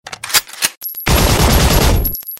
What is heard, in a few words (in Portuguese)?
tiro; shadowrun